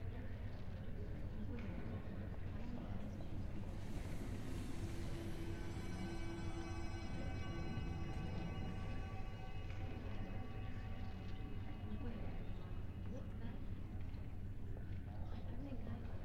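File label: ambience sentinel hub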